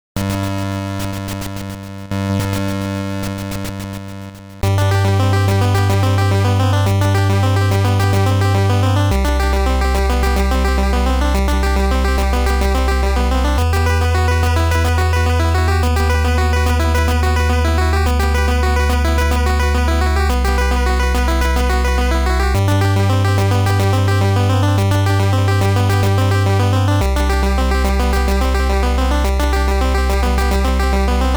Game Over

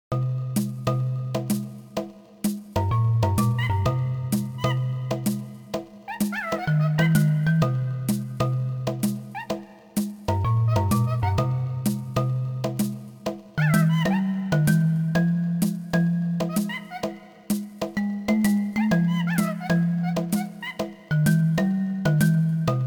Pause Menu; Donkey Kong 64

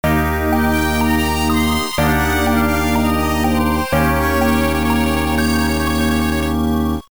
Bonus Lose; Donkey Kong Country 3; GBA

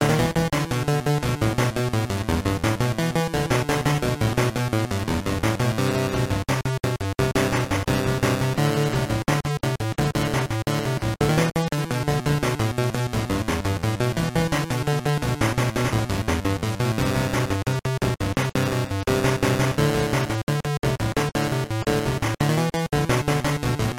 Complete; Battletoads & Double Dragon

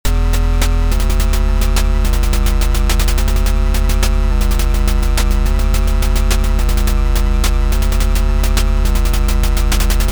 Double Ball Ready

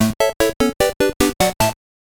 High Score; Cobra Triangle

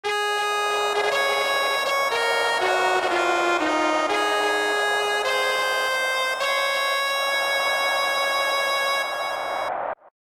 Canada Anthem

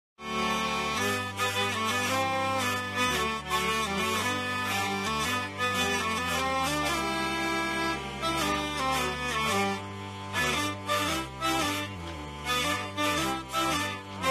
Jolly Good Fellow Hurdy

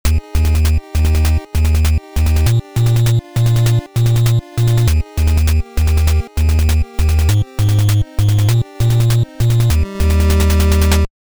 Game Over

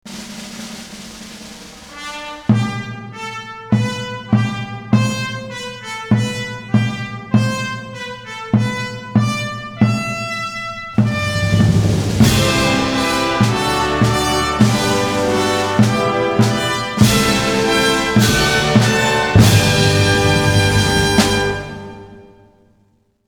Romance Dance; Roario

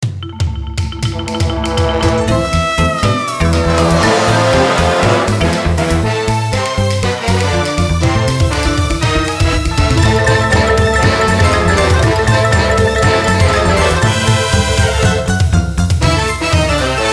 Romance Dance; Macaraccoon

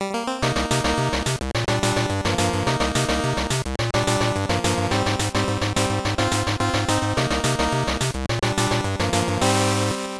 Jingle

